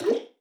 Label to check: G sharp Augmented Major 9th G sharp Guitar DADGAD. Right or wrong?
Wrong